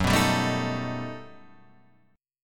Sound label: G Augmented Major 9th